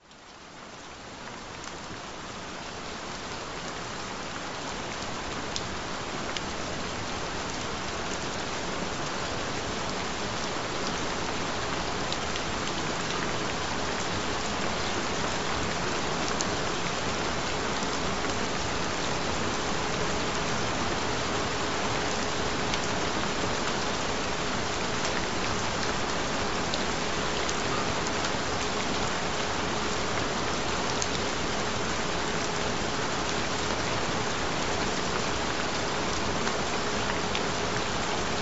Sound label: Rife Frequencies, Relaxing Rain Sounds